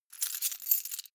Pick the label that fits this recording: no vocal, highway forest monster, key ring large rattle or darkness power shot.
key ring large rattle